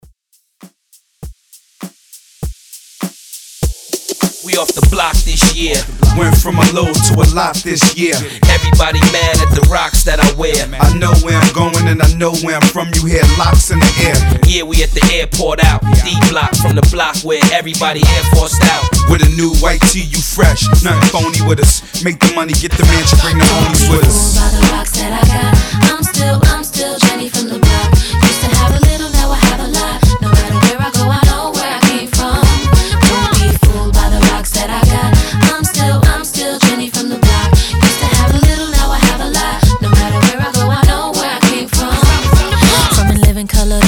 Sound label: Intro Outro 100 BPM